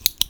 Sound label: click; Untitled Maze Game